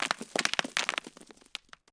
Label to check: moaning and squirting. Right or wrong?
Wrong